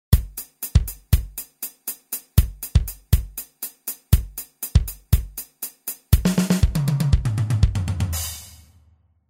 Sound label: drums pumping and breathing